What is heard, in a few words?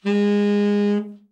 TEN SFT, Sax; TEN-SFT SHRT; Giga Samples Collection